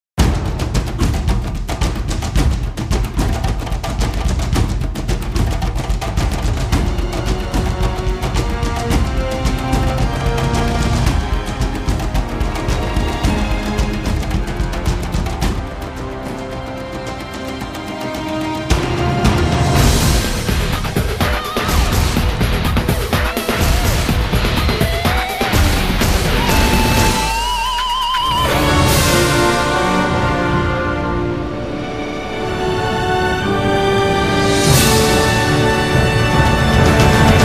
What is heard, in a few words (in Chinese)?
宣传片背景音乐